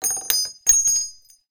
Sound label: cart